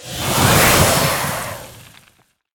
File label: Quicksand Elemental Attack